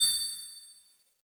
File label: Collect star